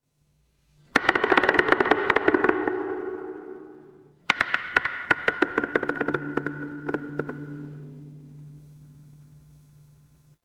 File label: horror creak